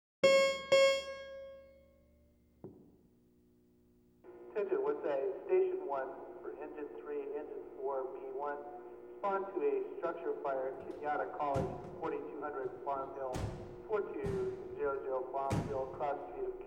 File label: Sound Effects: Fire Department Code 3; Surround Spectacular